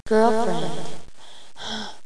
STARTUP; Girlfriend Tracy

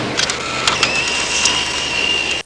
camera sound; running-man

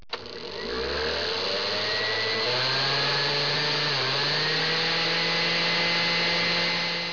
blender; 12000 Graphics Pack - A Complete Graphics Collection For Your PC

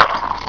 Enemy Dies; Mantra v1.02; Game Sounds

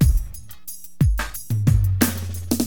DM LOOP, HipHop, Drums; LiveAct Pool

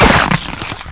crush

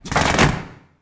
LAY ROAD; interface

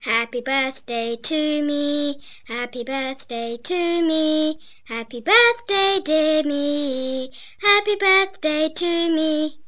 sing; Dorling Kindersley My First Incredible Amazing Dictionary

